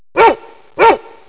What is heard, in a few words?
8bit; vector-python-sdk